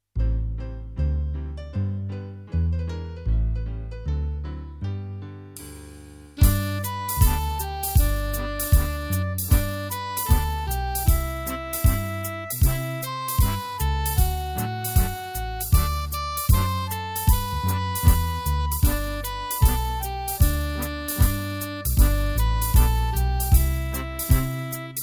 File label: Jingle bells; snabbenkelarr utan repris